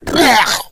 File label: female zombie growl; player; voice; source engine; left 4 dead 2; boomer